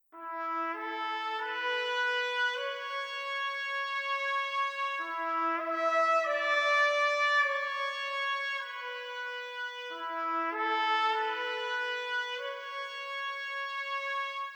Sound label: legato